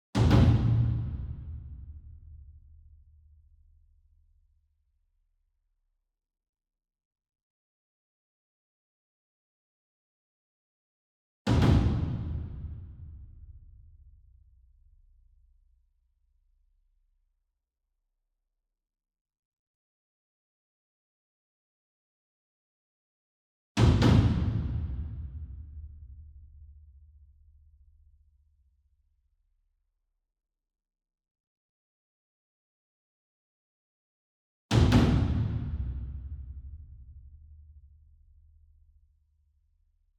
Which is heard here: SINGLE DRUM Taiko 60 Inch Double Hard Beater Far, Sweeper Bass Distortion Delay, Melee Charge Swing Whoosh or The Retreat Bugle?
SINGLE DRUM Taiko 60 Inch Double Hard Beater Far